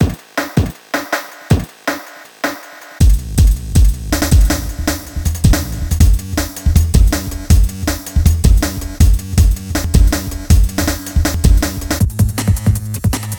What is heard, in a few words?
Drum and Bass DJ Tools; Bluezone